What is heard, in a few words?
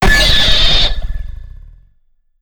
screaming baby static; dogma